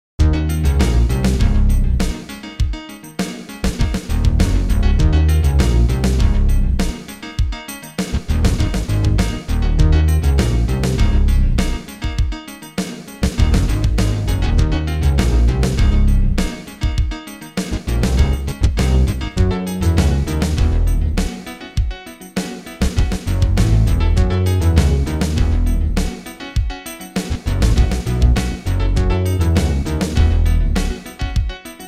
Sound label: Funk; The Incredible Machine